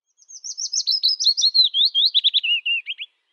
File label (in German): Fitis Phylloscopus trochilus; Vogelstimmen